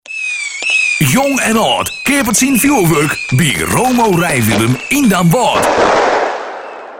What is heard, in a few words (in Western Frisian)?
Fjoerwurk klein; ringtone; Romo Rijwielen